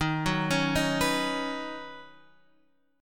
Eb Minor 7th Sharp 5th, down, Guitar, Half Step Down; arpeggiated